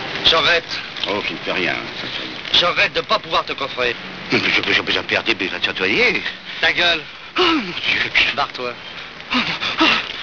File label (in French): ecrase